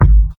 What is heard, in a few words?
tight lows bass drum one shot g sharp key